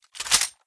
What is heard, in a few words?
Reload; blue archive